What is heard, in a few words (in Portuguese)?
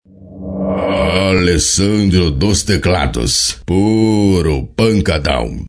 teclados